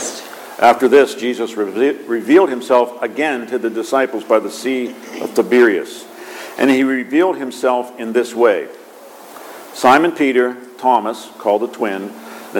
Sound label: Gospel